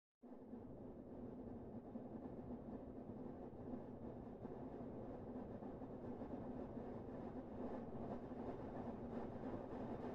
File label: Drivetrain